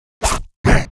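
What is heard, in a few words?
Mob attack; Bossmob; 야수맹; 야수귀; 2단계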